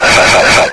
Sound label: bullchicken attack growl; mistake coop